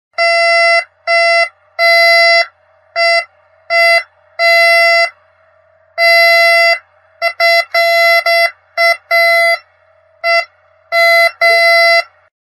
metal detector; فلزیاب

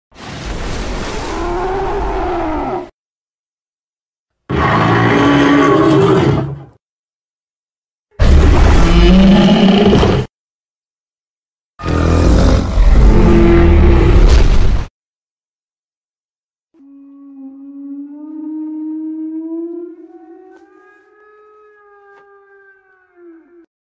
mosasaurs, موساسور

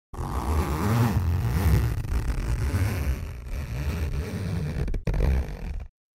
luggage; باز کردن چمدان

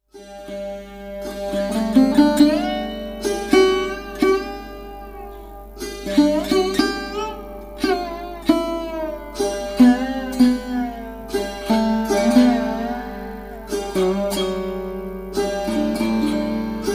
sarod

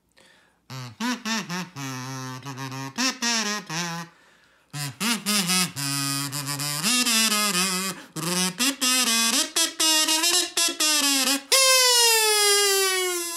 kazoo

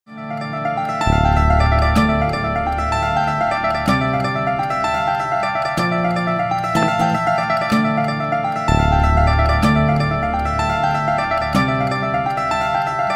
Harp Guitar; گیتار چنگ

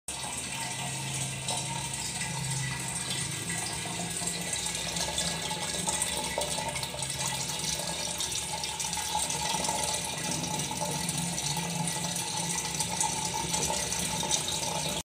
bathtub

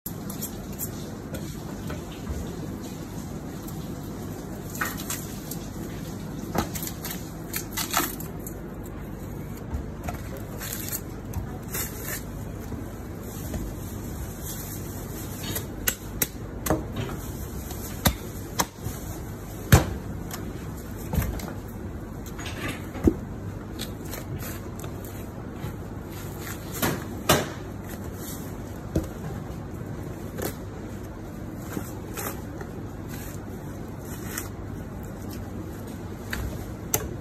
Cut meat, شقه کردن گوشت و استخوان

